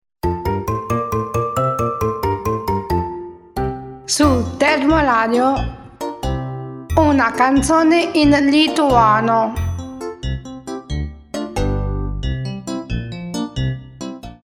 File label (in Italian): Canzone in lituano